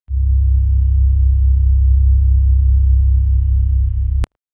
old interphone sound

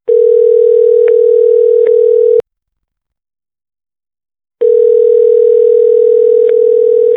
phone call tone and the, telephone

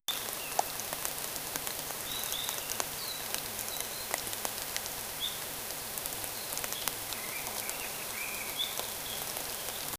rainforest sound, rain

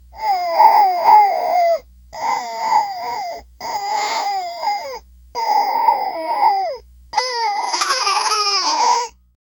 cartoon baby dragon roar; vocals

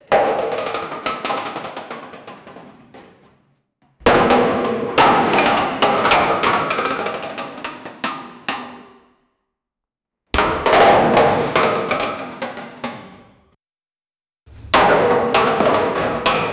huge collection of balls

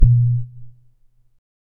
one bubble hit on wall

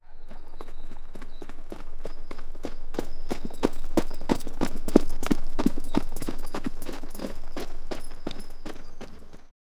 horse running in field

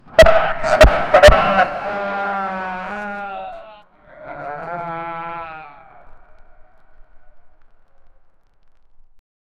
scared sheep bleating

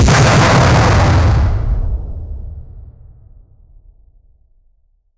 a shot from a tank, explosions

